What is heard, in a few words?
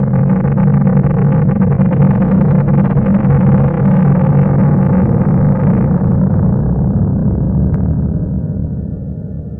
Plate Tectonic, Environments; Sonic Foundry (Sony Creative Software); Myths of Technology